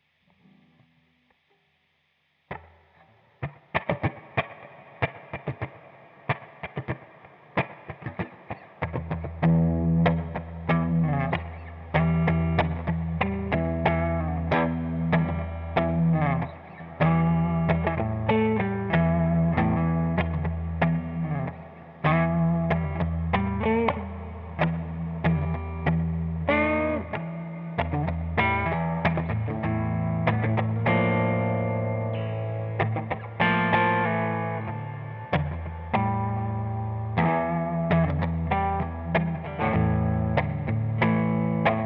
E 95 Fuzz Groove

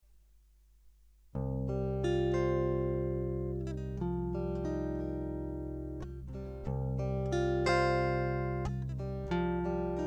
Stone Kat Arps

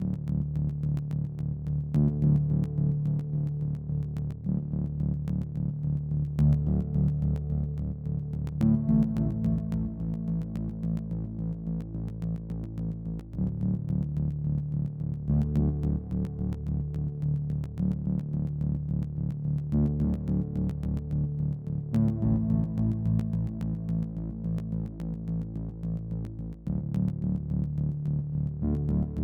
D72 Bass2; Closure

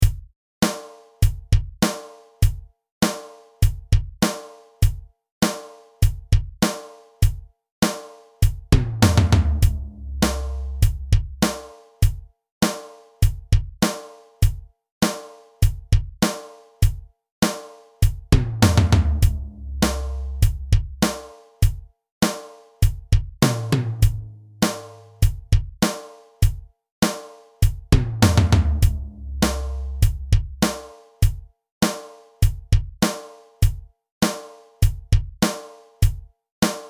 Gm Rock Groove; admiralbob77

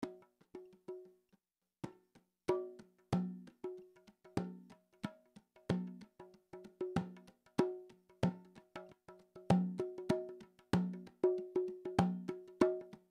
Mellow Bongo Drums

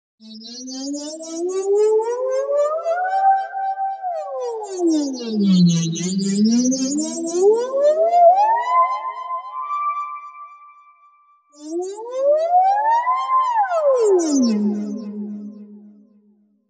Synth

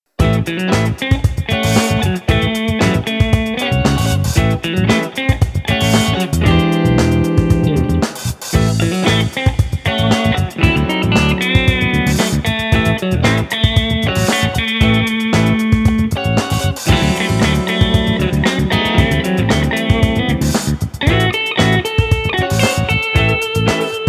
Blue Funk

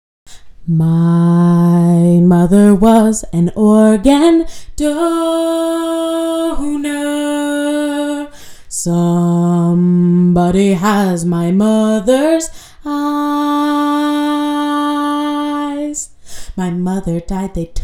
Vocal Stems

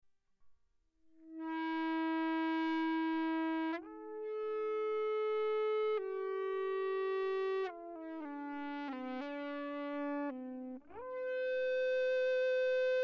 Ebow; Mille Regretz

